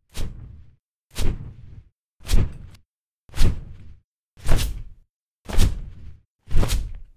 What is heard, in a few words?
Large Flying Creature